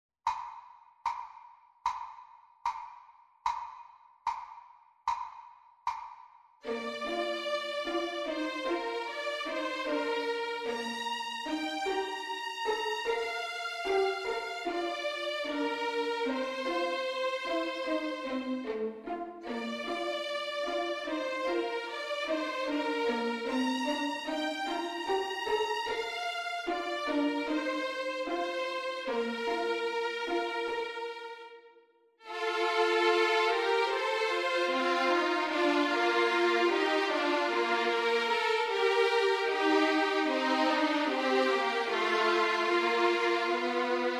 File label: Backing Track; Swanee River; ViolinSchool